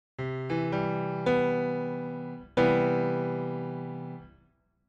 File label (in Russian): bolshoj mazhornyj septakkord